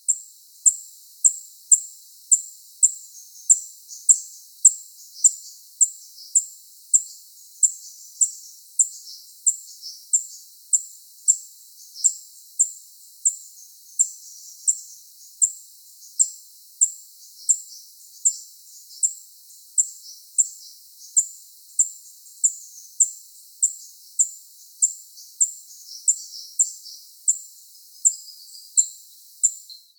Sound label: Bronzy Inca Coeligena Coeligena